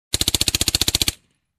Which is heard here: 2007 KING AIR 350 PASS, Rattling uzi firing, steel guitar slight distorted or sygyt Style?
Rattling uzi firing